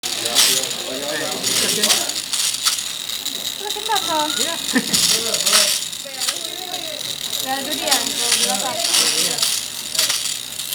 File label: Using a electric hoof clipper